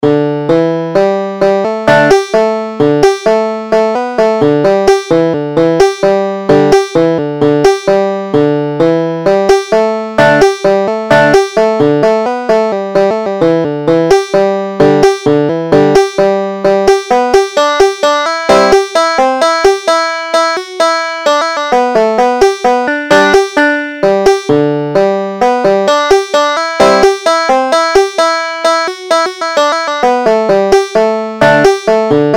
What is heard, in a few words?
banjo